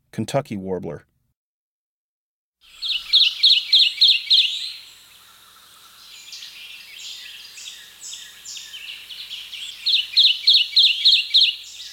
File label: Kentucky Warbler